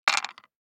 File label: DICE on Wooden Tray, Throw and Roll, Standard, 4 Four Dice; Samples; ArticulatedSounds; DICE Sfx Party (Extended)